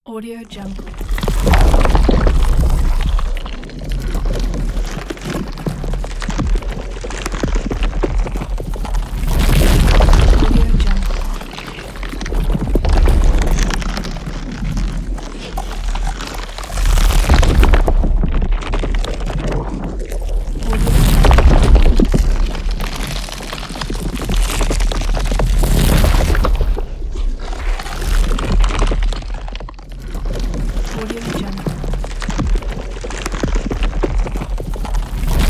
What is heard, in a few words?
a alien monster ambience